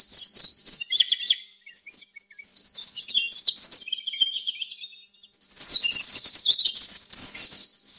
birds morning; Nature; weather

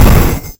fireball explode; Magic; magic spells

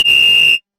whistle blow; Sports & Arcade; freepixel

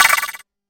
skeleton rattle; Creatures

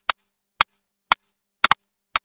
footstep water; Footsteps

